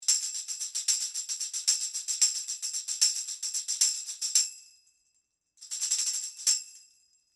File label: ELAM 251 OMNI Tambourine